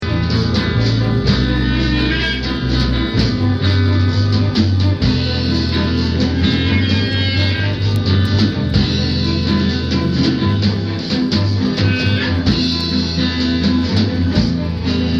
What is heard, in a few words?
Ominous Bass